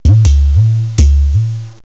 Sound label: SURDO; BigMax nº 9